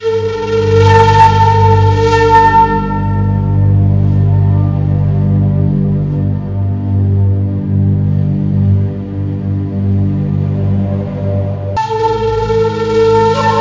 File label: ringtone